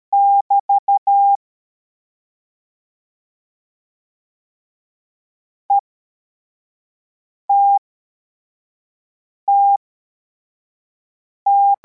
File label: cw, morse